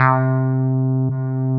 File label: TB-505, SynthLine; 90 s Sample CDs; NiceBeats; SynthLine VOL-2